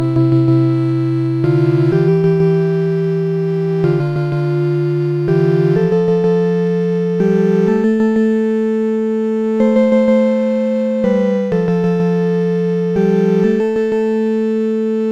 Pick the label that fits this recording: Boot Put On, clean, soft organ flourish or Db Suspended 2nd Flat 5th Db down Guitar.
soft organ flourish